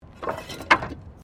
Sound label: Wood Metal Move; Material components for constructing more complex sounds; Materials